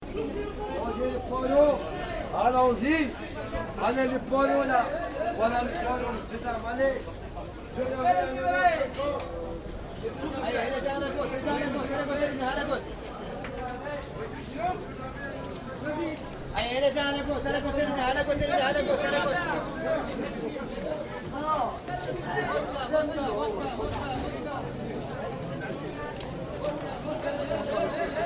market sounds marche beauvau rue d aligre paris

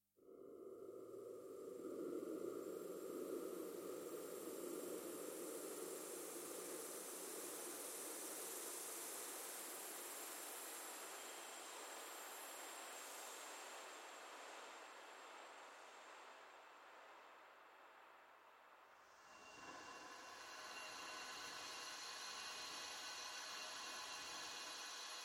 clipping insects

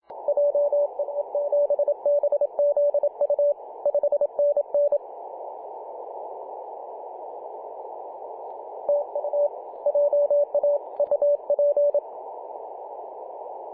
18.086MHz CW; PJ2/G3TXF

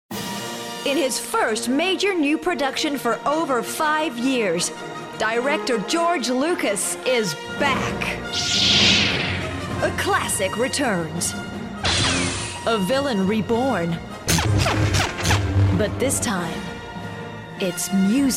film trailer guitar; film trailer guitar wars

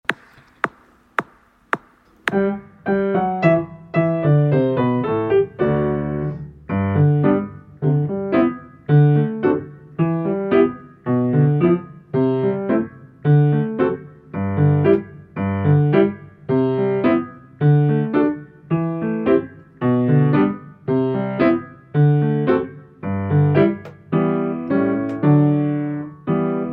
English Country Gardens piano only

